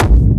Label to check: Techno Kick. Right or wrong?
Right